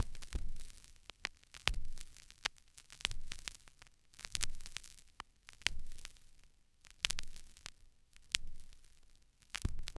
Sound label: vinyl scratch